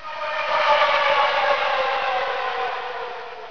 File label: BACKGROUND; Marathon revisited